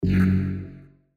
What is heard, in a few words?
Bump